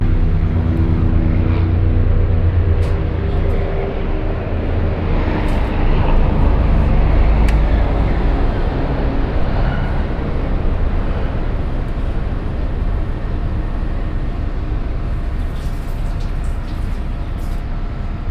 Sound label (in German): morgens St.Cecilia unterm Minhocao, Fieldrecordings Brasilien